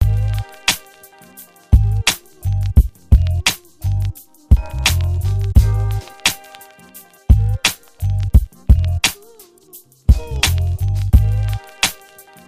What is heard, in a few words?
beat